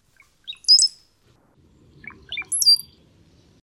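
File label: Cowbird Brown Headed Male Songs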